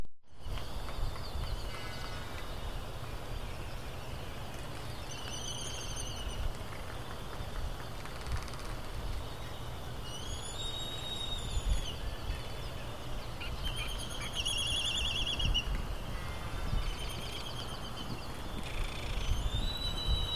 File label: Black Noddy White Tern Duet